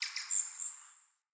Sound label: Bird Song; Paragon Bird